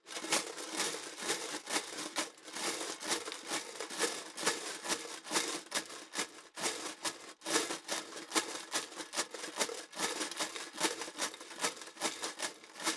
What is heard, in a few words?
troops metal clatter chaos